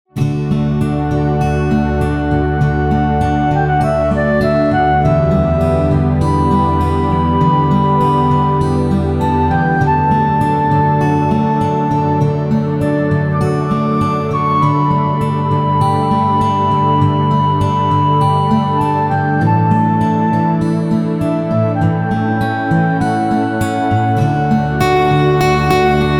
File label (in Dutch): Kom laat ons juichen Instr